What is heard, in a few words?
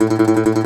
DOMRA, Plucked Strings, Segundo Domra; E-MU Producer Series Vol. 7 – Old World Instruments